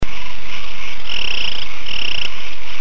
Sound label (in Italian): grillo, versi degli animali; animalandia